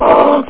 GROWL; drwho

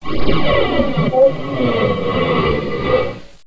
Scrape Weird

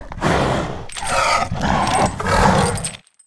troll

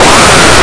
QUACK

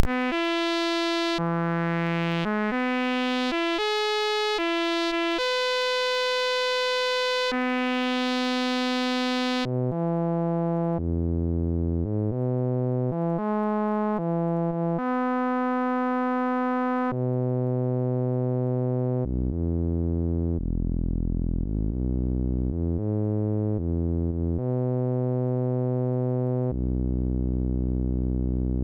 brass

